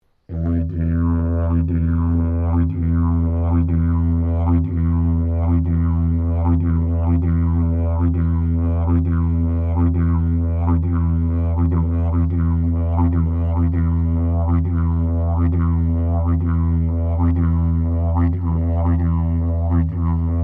Didgeridoo Traditional Tapered Maiali Drone, Traditional Australian Aborigine; Traditional Australian Aborigine didgeridoo designs